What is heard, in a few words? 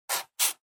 Pencil Check